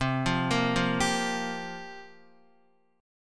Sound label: C 7th Suspended 4th, Guitar, chord; C7sus4 arpeggiated